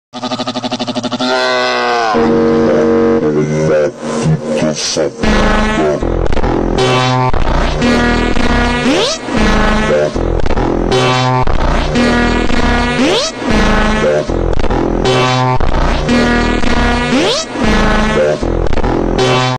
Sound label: phonk; memes; realmadrid; cr7